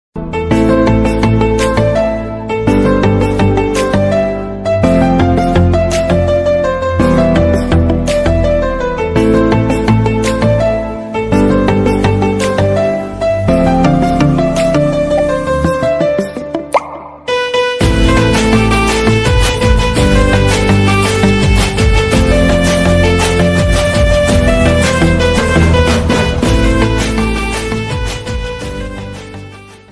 instrumental ringtone romantic ringtone malayalam ringtone malayalam bgm ringtone